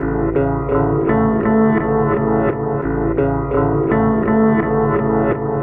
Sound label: Synth Guitar; Sonic Foundry (Sony Creative Software); Knutrix Drum & Bass